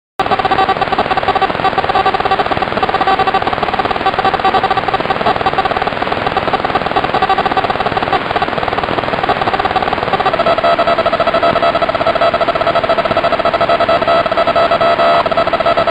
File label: PLC 24MHZ AM MODE BW 6KHZ